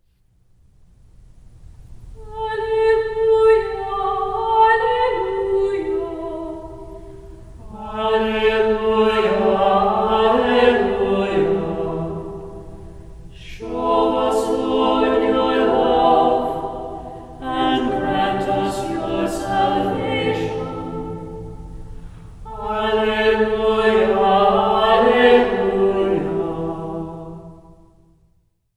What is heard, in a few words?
Alleluia SATB